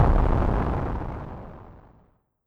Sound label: explosion